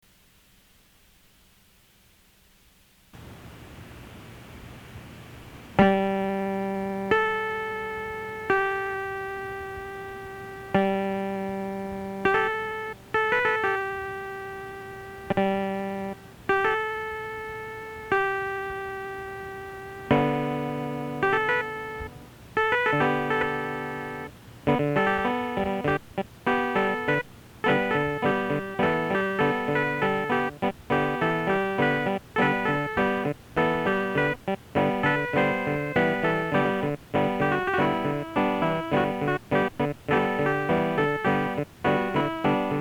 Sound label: g bluegrass